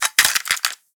Cassette Rattle

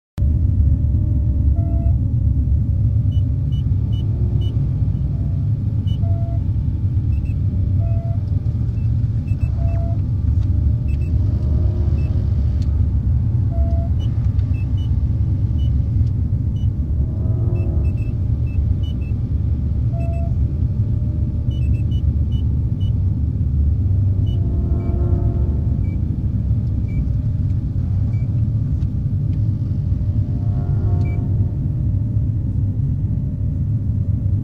SFX Amb Ship; Family Guy Back to the Multiverse

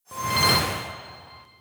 tracks unlock notif counter

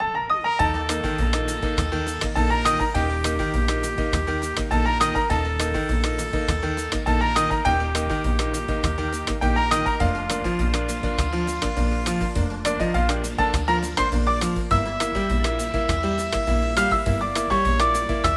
Menu - Little serenade Loop

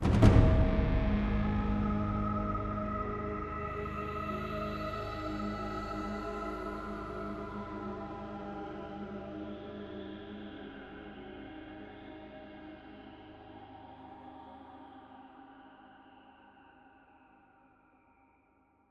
Loss; apex legends season 12; Wraith

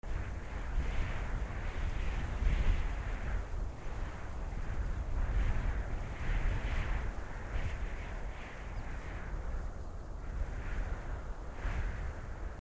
Home Wind; children of morta

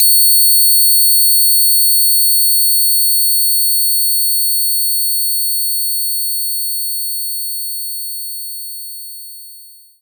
Shenai D6; knights try

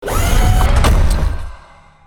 Ruins Platform Down

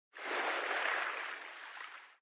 swim

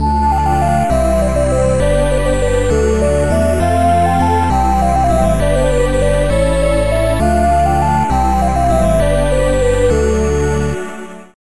Promoted; warblade windows gamerip 2003

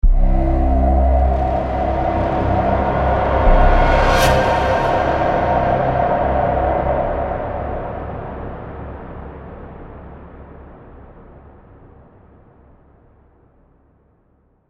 Stinger, soundtrack

